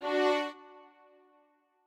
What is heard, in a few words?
strings